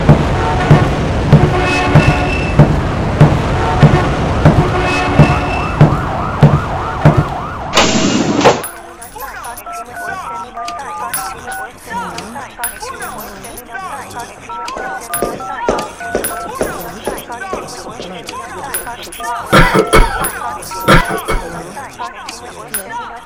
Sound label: Busy Mall; Before COVID